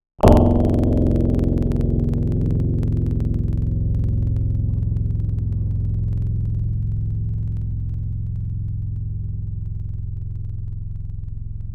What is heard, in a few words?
Bandura; Synthetic's Serum Collection; Echo Sound Works Sphere